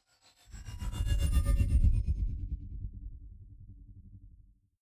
sci-fi ambient security scanner; swooshes-swishes

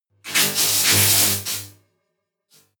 futuristic electric spark; comedy-cartoon; elektronisch

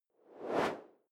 whoosh simple steady; swooshes-swishes

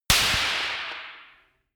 gun clapping pop gun; schusswaffen

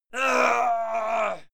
male fight pain; soundpool

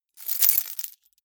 key ring large rattle air hard

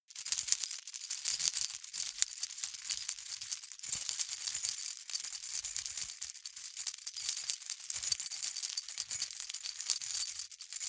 key ring large rattle air sequence medium; objekte; schluessel

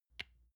lotion large lid close soft; berufe; friseur

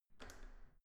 entrance door handle down soft

